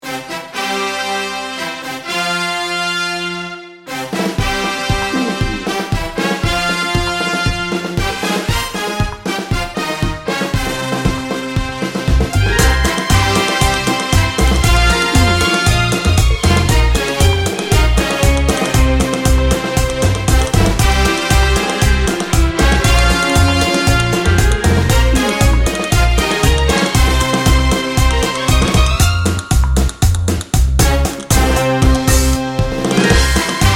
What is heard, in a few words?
paso doble dancer 60 second